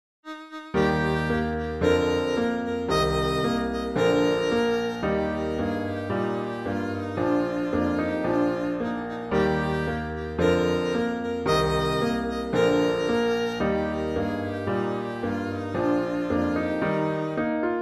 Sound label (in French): ecossaise; beethoven ludwig van